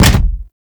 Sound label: Sphere Projectile Bounce; Warframe; Gyre